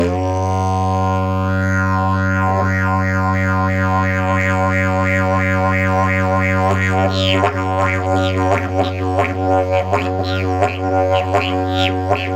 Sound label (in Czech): javor, didgeridoo